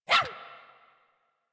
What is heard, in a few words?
Puppy Idle Bark; Enemies; Vocals; Kubrow; Warframe